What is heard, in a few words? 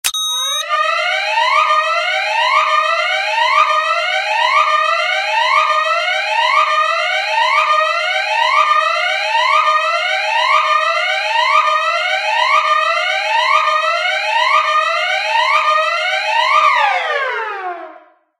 Police Horn Alarm Ringtone; ringtones